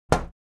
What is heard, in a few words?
Knocking on window pane